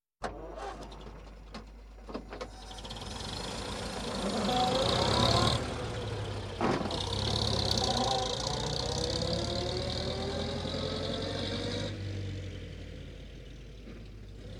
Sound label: Car Vintage Start Depart; The 39 Steps